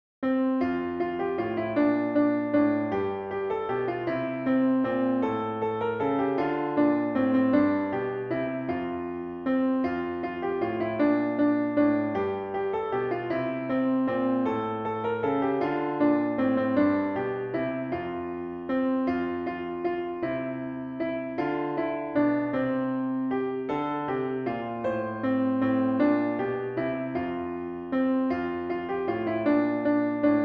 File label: We Wish You A Merry Christmas（ピアノ）